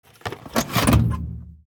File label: Ammunition box metal case close; Military Equipment Sound Effects